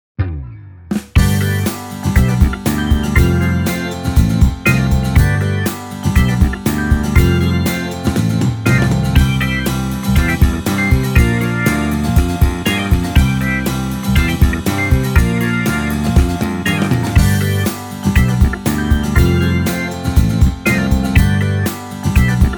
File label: Kontakt Rock